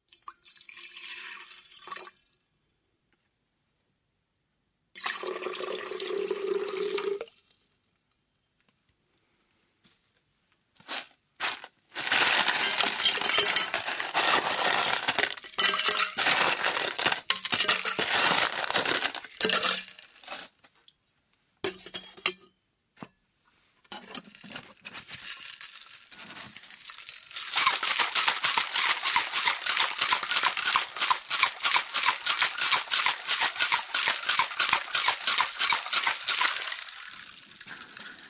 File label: shaker